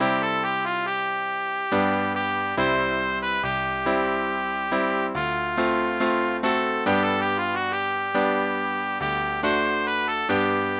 In the rain V daždi - pre trúbku a klavír

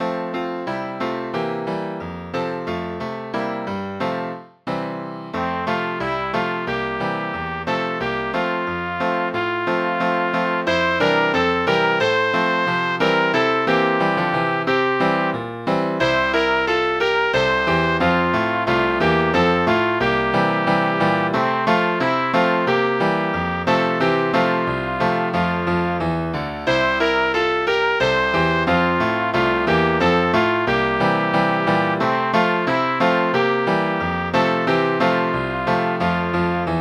Takí sa mi šuhaj - pre trúbku a klavír

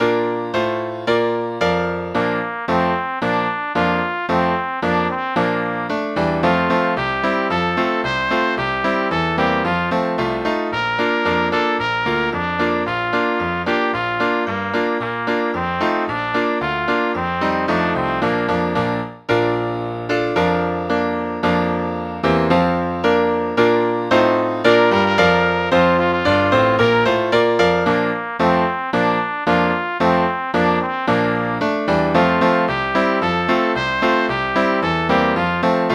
Pri Prešporku kraj Dunaja, pre trúbku a klav.

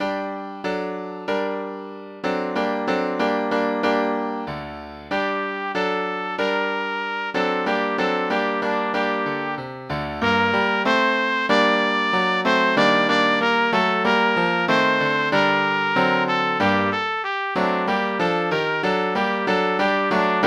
pre trúbku a klavír; Keť Aňička húsky pásla

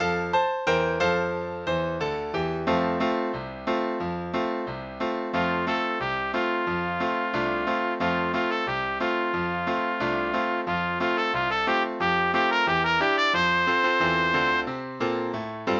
pre trúbku a klavír; Malá baletka

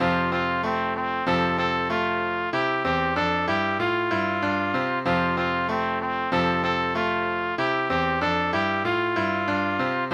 Išli dvaja žobráci - pre trúbku a klavír

